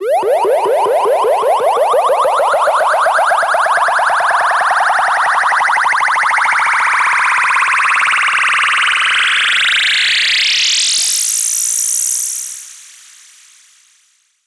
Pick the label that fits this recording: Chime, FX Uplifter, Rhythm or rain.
FX Uplifter